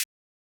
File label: Analog Hi-Hat, Electronic Drum Samples; E-Punk Project Drum Samples